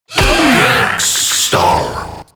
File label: Pain